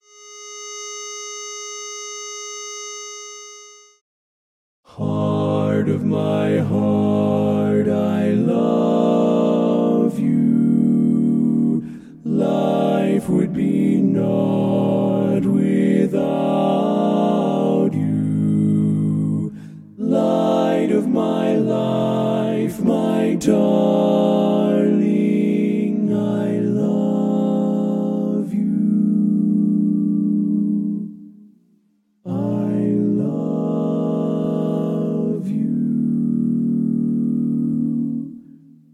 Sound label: baritone